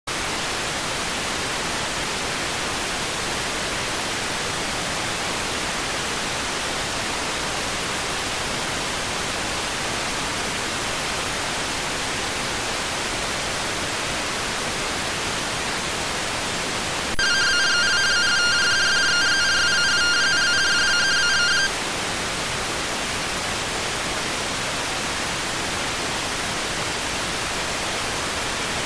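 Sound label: radioteletype receive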